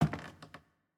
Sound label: Footstep Basement Wood